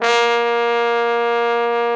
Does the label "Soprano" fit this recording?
No